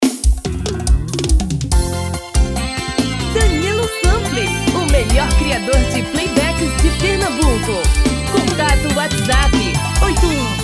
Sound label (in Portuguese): Brega; tom feminino